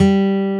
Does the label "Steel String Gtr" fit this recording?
Yes